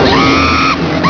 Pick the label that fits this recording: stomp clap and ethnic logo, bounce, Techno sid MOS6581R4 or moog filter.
bounce